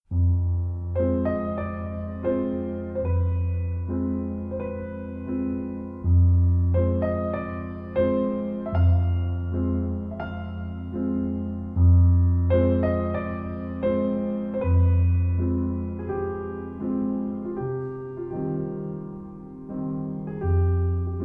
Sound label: Gnossiennes No. 3 - Lent, Piano